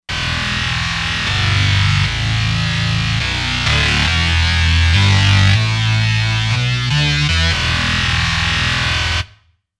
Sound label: Bass Drive